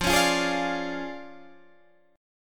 D Minor Major 7th Flat 5th, F

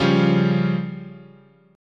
Db Major Flat 5th, chord

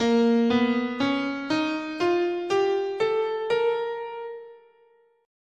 Bb Neapolitan Major, scale

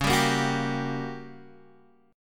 G Minor Major 7th Flat 5th, C sharp

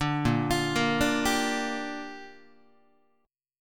A sharp 6th, guitar chord; arpeggiated; JGuitar

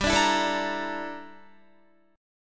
G Minor 6th Add 9th G down Guitar

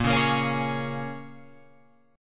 Bb Minor 7th, Guitar, Open F; Bbm7 strummed

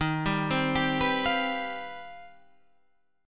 C Minor Major 11th Eb

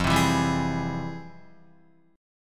F Minor Major 9th, Guitar, chord; FmM9 strummed

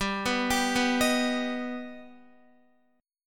G Augmented G Guitar; G+ arpeggiated; JGuitar

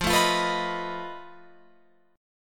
F 7th Flat 5th F down Guitar Open F; F7b5; strummed

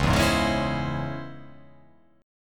C sharp Minor Major 7th C sharp, chord

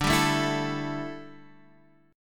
D Suspended 2nd Suspended 4th, Guitar, Standard; strummed

